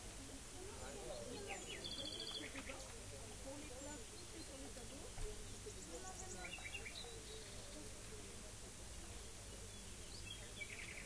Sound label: rayol farm; SEAMED